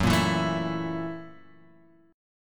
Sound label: F sharp 7th Sharp 9th; F#7#9 Chord {2 1 2 2 X 0} strummed